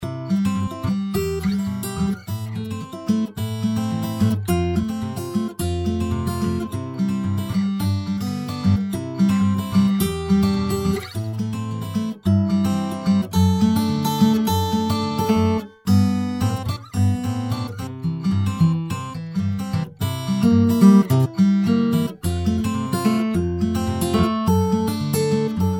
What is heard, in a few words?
Pettinhouse fingerpick